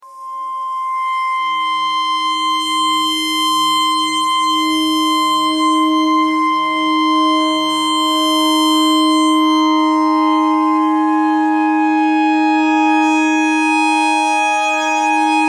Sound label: clarinet notes e clarinet notes e pentatonic